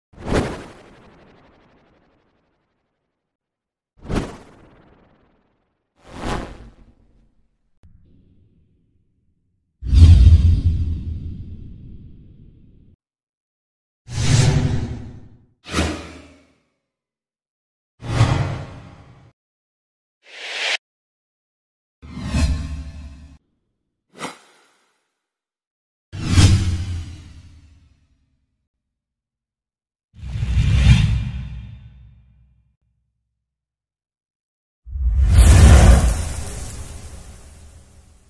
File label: Slide Sound Effects